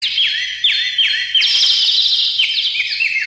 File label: srilankan bird emotional ringtone